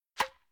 Tennisbal Wordt Geslagen; KMP Film Video Sound Effects

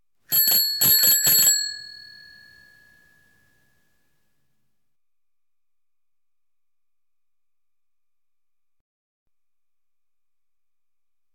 Fietsbel; KMP Film Video Sound Effects

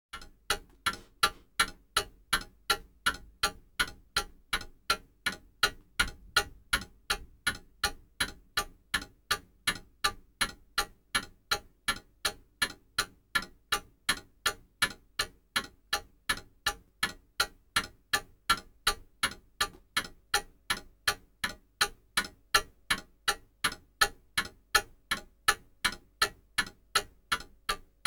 Tikken Klok; KMP Film Video Sound Effects

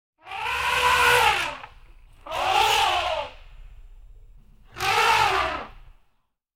Olifant Boos getrompetter; KMP Film Video Sound Effects

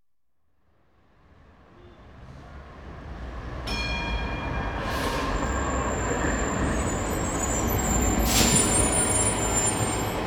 Drukke Tramhalte Amsterdam; KMP Film Video Sound Effects

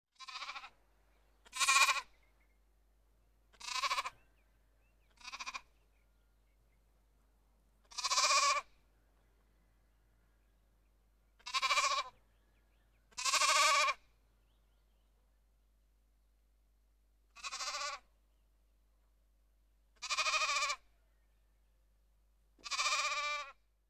Schaap - Lammetje; KMP Film Video Sound Effects

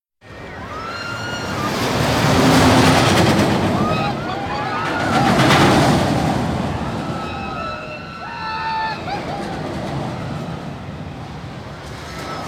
Rollercoaster Rit; KMP Film Video Sound Effects

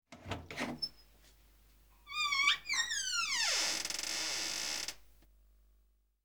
Deur Piepend Open; KMP Film Video Sound Effects

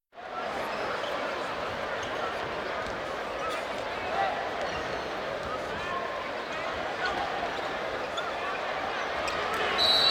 Basketbal Publiek; KMP Film Video Sound Effects

